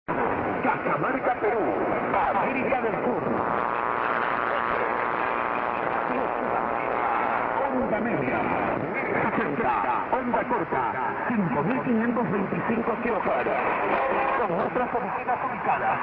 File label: Cutervo 5522 kHz; Peru; R Sudamerica